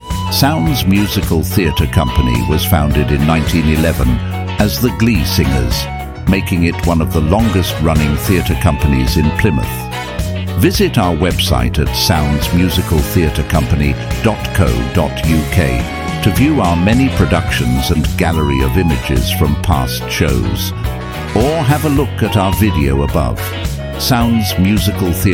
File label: Theatre jingle